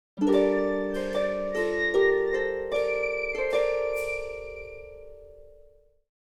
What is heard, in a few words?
Video game success victory win fantasy